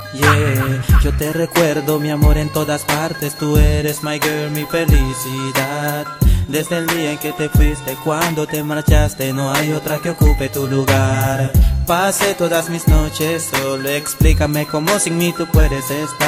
solo lonely

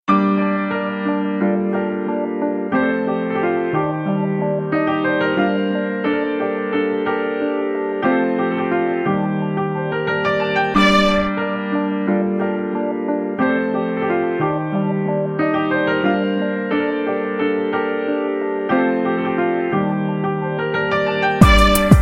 piano

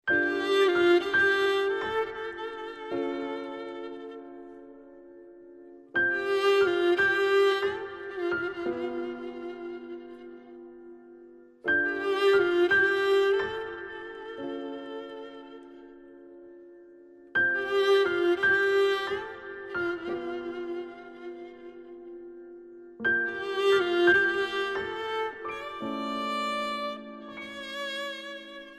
violin; recep ivedik

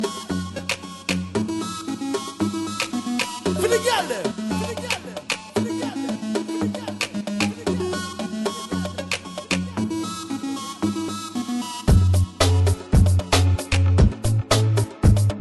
go go riddim instr

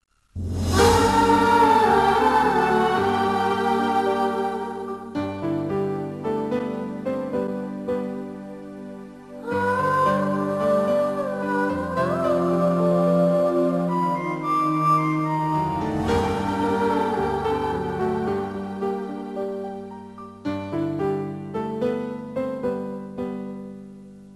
patriotic tone

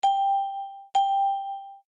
ding ding

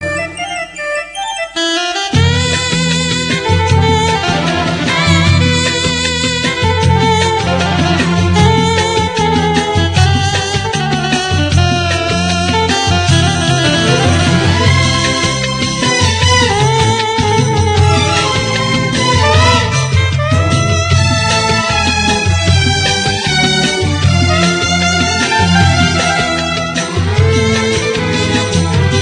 sad arabic music

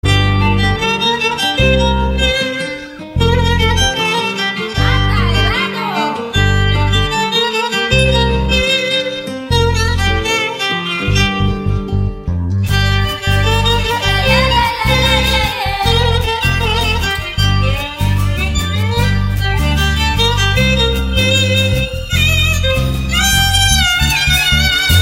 best violin music

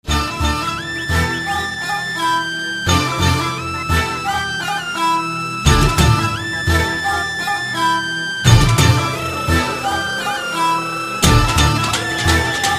Latest Punjabi

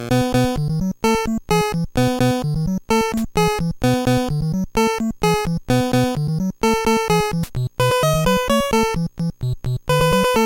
midi; ghostbusters

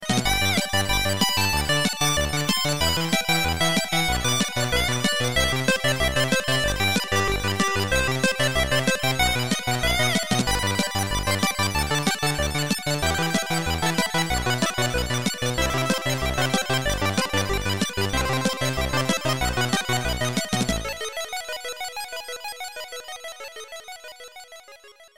keygen music 8bit chiptune

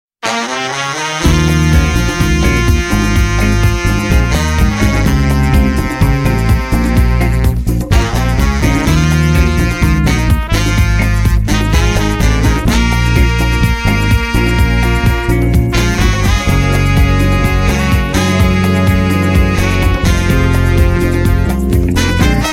alcohol jazz

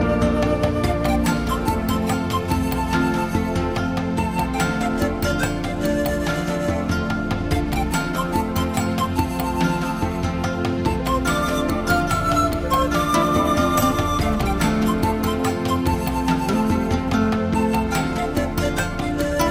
flute

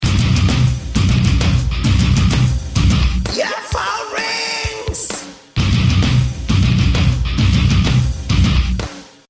hardrock phone

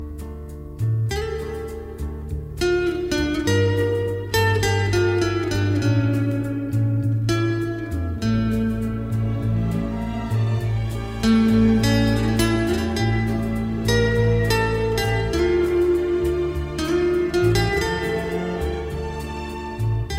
guitar

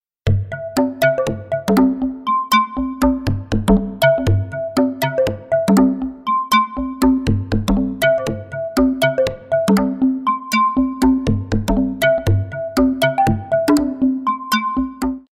ringtone; alcatel

